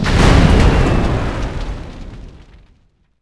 Gunship Die; Civ4 warlords

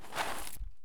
item flakes foodtake; Escape from Tarkov

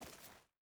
footstep burlap stones walk; Rust

